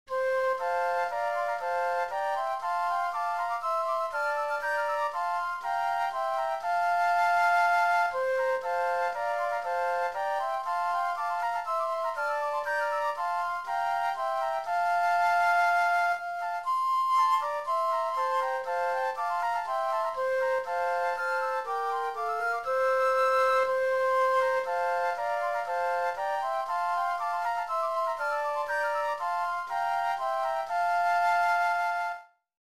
Flutes

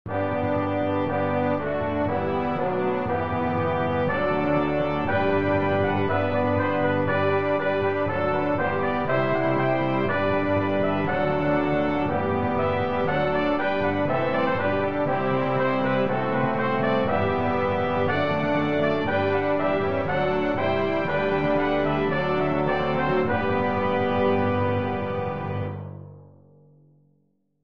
Hymn

